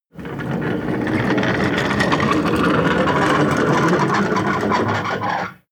slide wooden door

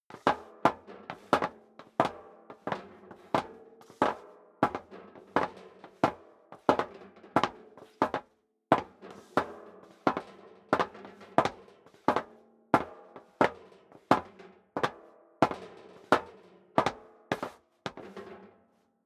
step sneaker metal rattle walk sequence; schritte

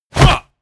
infantry salute, militaer; industrie wirtschaft

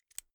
insert xlr extension latch hard heavy; objekte; elektronik

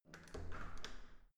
entrance door open quick

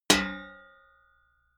sheet ring; hits impacts

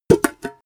paint metal tin open; objekte; dose

